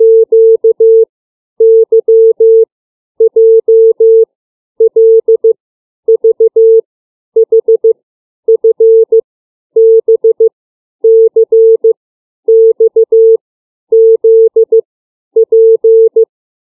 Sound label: CW alphabet